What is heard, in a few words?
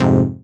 Fm Dub Chord